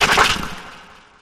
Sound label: cry